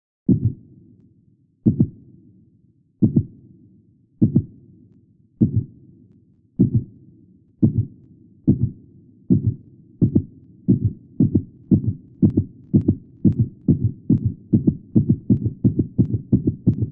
Heartbeat Speed Up Low, Heartbeat starts slow then speeds up and intensifies under stress; and pressure